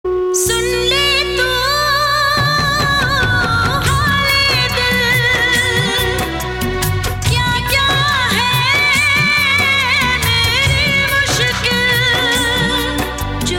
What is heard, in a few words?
Sad Ringtone